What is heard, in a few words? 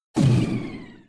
Desintegrator